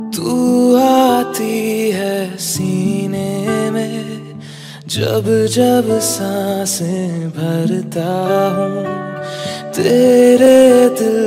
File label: Romantic Hindi Song Ringtone